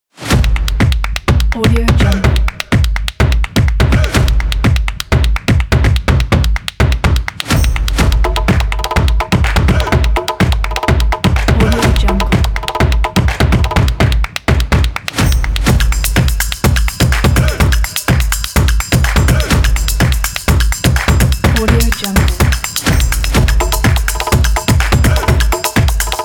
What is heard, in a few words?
stomp